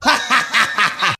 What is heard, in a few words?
laugh; Voice; ooh ahh